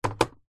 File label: videogame controller xbox set down wood table